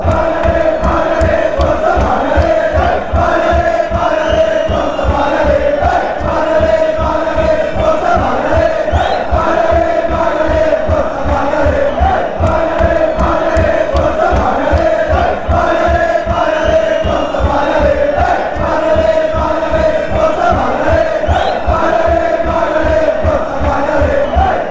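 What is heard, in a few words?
come on bari ale, football chants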